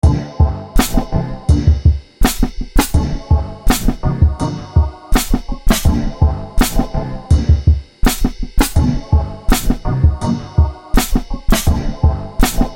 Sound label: boombap